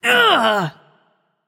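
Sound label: grunt hit, female; smite; voicepack; hera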